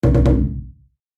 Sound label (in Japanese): wa dodon; 和太鼓の3連打ドドドン！という効果音; 和楽器・和風の効果音